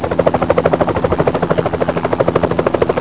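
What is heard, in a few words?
Helicopter; avanim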